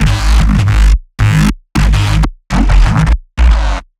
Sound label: F-G-A FM Growl Synth; neuro; BIG5AUDIO